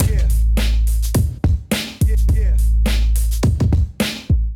Groove 108 Bpm, Drums; MIDI Clips; Safe House; Ableton; Chop and Swing

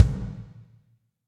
Kick Plastic Dark Plate Verb; Drum Hits; Ableton; Factory Packs; Session Drums Club; Standard Hits

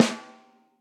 Snare Hit & Rim Reso Classic; Drum Hits; Ableton; Factory Packs; Session Drums Club; MPE Hits

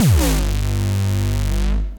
Boulder Drop; Bass; Ableton; Skitter and Step

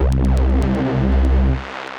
Violent Reese; Bass; Ableton; Skitter and Step

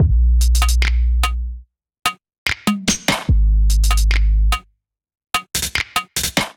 Groove 73 BPM, Drums; MIDI Clips; Buttermilk; Ableton; Chop and Swing

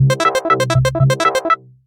Squeeker; Ableton; Retro Synths; Vector; Vector Wave Sequences

